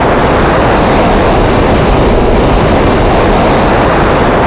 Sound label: Doppler; machines